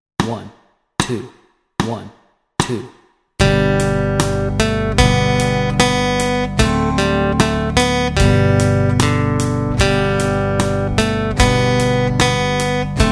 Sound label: Alouette Melody and Chords Track, guitar